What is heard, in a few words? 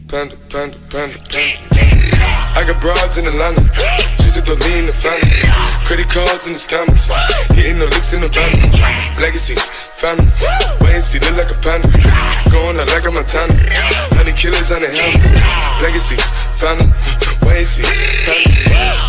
cool ringtone